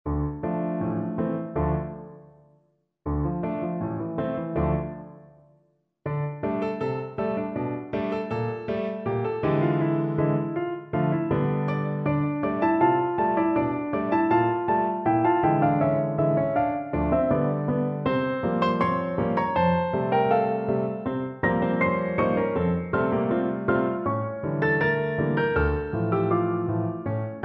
piano 4 mains

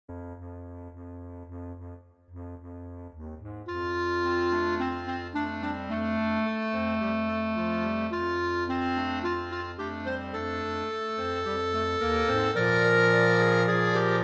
quatuor clarinettes

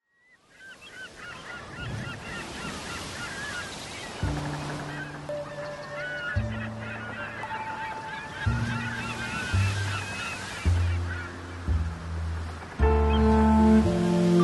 violoncelle

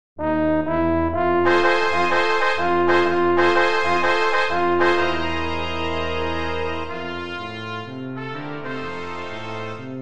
foxaswing cuivres

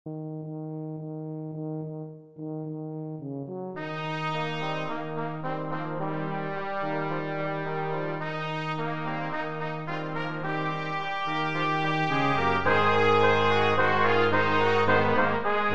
sur la muraille de Chine quatuor cuivres

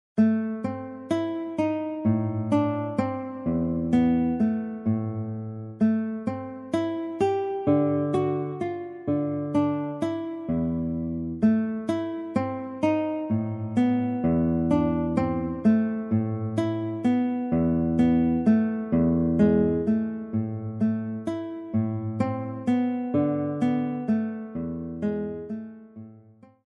Petite guitare

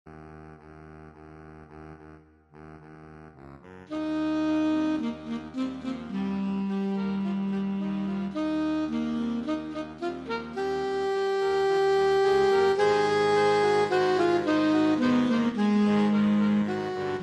sur la muraille de Chine quatuor saxophones

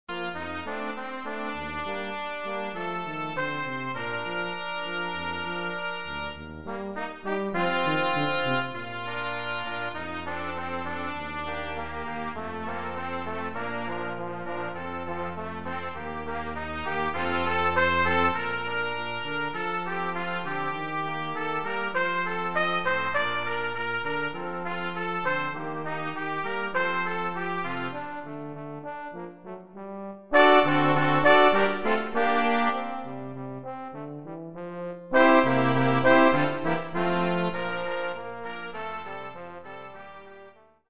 sous le soleil quatuor cuivres

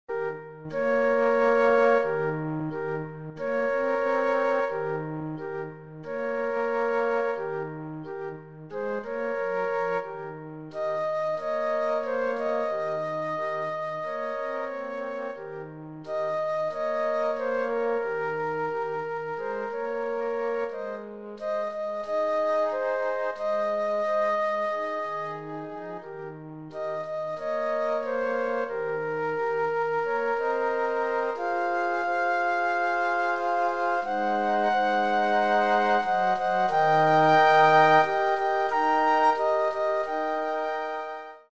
mon premier rock flute

